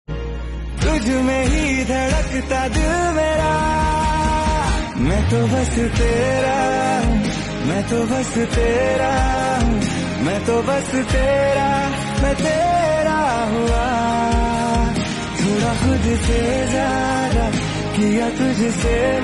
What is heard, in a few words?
Ringtone